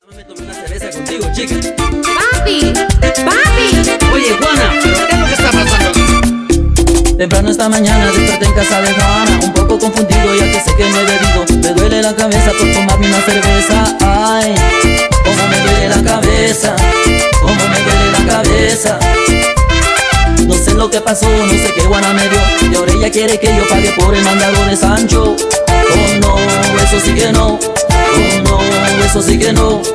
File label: Merengue